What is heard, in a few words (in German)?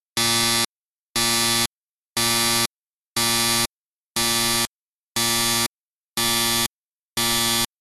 alarm 8 mal signal sehr; salamisound